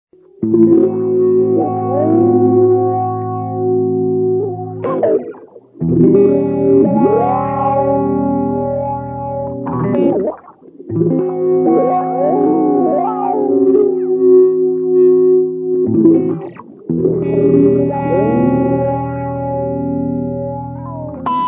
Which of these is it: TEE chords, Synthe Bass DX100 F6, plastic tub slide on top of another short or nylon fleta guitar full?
TEE chords